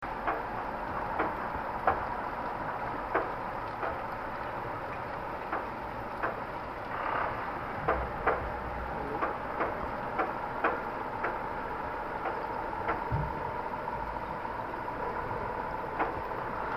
ghost knock, monaural recording of the Thames river, London